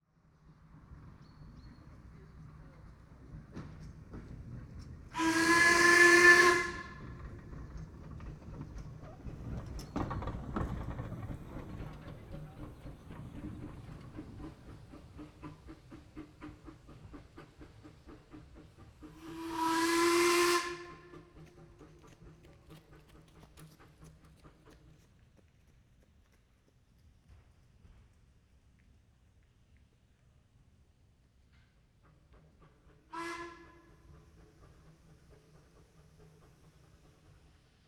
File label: Vehicles Steam Train Passing By Stereo Binaural